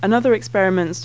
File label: harmonics